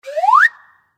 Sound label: whizz